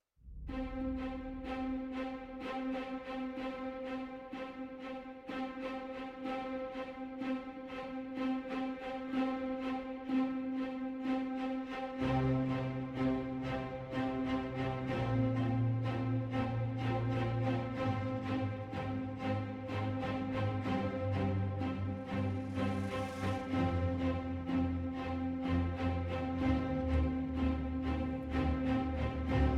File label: Orchestral